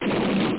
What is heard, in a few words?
crumble; dosgames; tankfor2